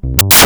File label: popup; Menu Popups